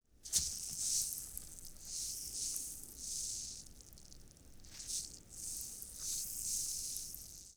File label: Spider Hiss, Vocals - Monster; Sony Pictures Sound Effects Series